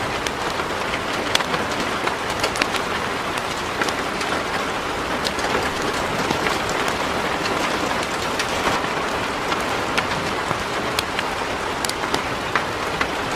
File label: heavy Rain; simple-weather